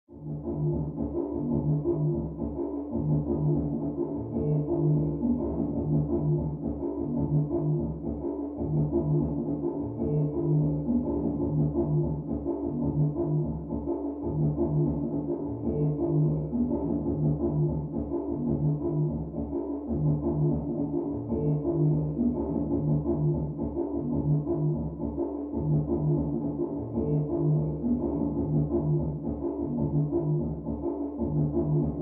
Mx Electronic Dance

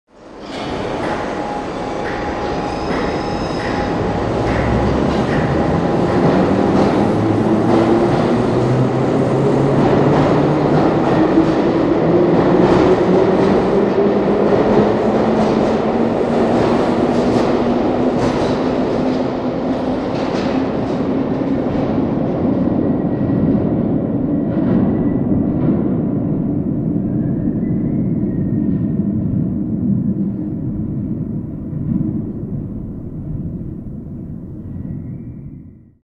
Tube Train Away From Station.

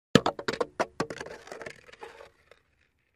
Basball Helmet Toss; baseball fx & games

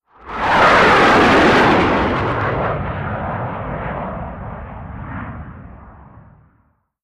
Fighter Jet Flyby Med; fighter jets

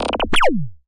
Multiple ray gun blasts. Zap, Ray Gun

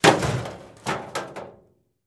Garbage Can Impact With Fall At End

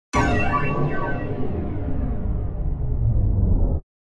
Hit Metallic Chime

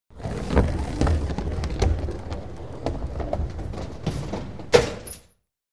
Bowling Ball Return

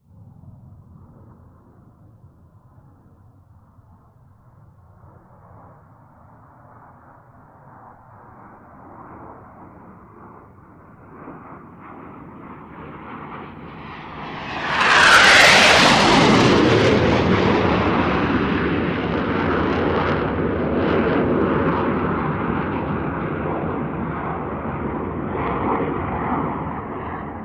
Gnat By Low Very Close

